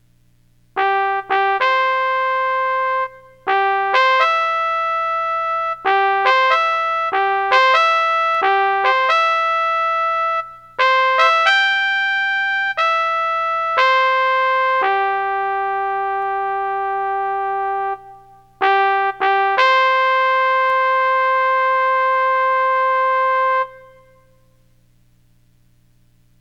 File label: Taps trumpet